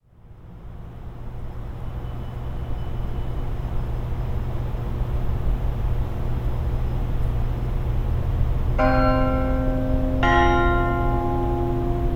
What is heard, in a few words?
Night in February